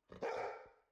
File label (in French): aboiement, chien; Nice